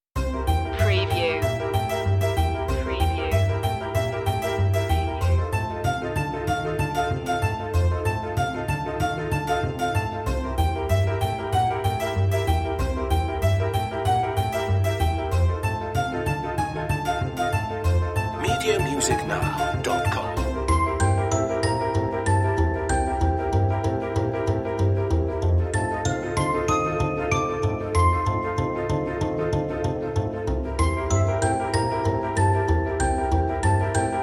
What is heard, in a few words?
no percussion